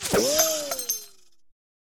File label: wall, combat target